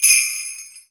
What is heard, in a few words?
jingle bell; AKAI S6000 CD-ROM - Ultimate